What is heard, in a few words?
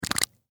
Household Gel Bottle Squeeze Liquid Bubble Blurp Hair Product; Bathroom